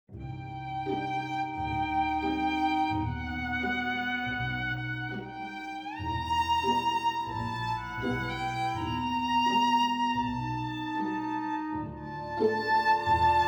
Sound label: violin